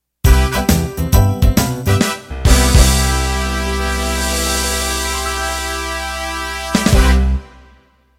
50s Rock End

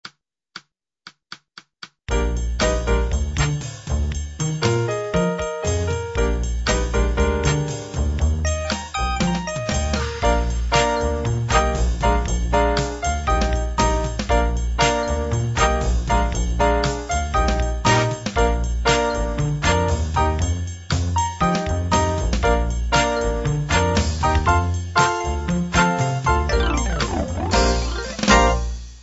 jazz funk

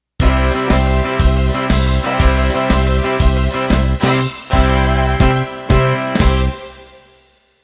Country Shuffle End

